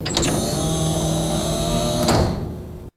automatic servo door opening; doors

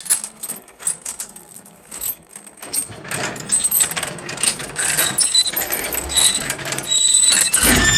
metallic chains rattling and squeaking

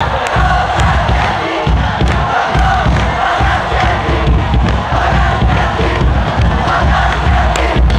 large stadium crowd chanting and clapping; crowds

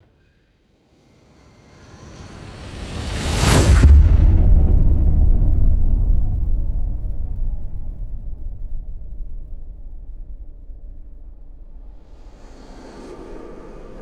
cinematic whoosh and deep metallic impact

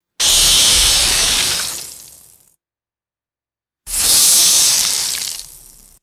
sharp hissing bursts of acidic liquid spray; liquid mud

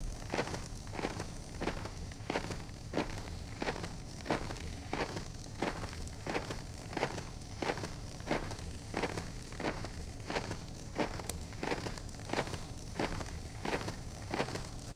steady heavy boot footsteps on dirt; foley

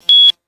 short high pitched digital beep; beeps

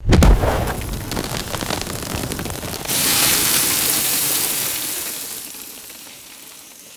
crunchy snow impact and raining debris

